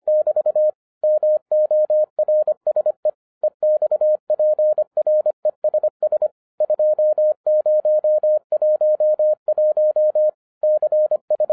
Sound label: MORSE 25 wpm; MORSE EXPRESS 2011 CHRISTMAS KEY